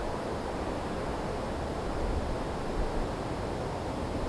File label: air; cstrike